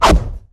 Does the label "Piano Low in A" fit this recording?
No